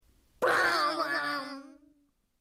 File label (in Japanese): ボウリング